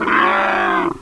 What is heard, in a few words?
voltigore pain; svencoop